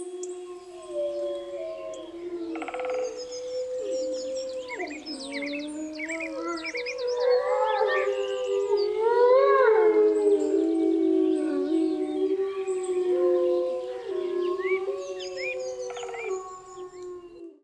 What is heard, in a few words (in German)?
Wolf